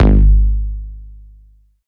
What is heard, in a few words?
bass